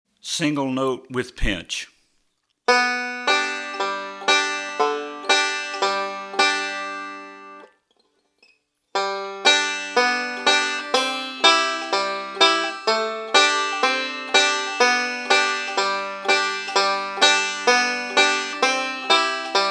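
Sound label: First Picking Pattern, banjo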